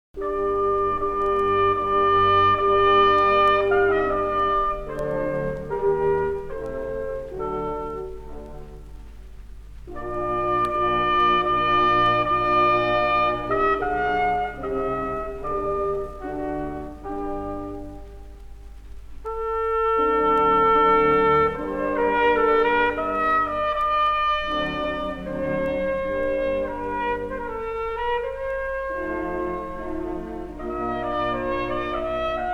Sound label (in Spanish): Sonido de fliscorno; Instrumentos de viento; Viento-metal